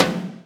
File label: Percussions